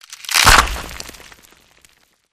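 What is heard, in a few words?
Rock Large Crack Impact, earthquakes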